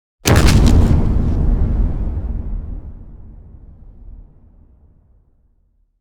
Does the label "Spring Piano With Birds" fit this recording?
No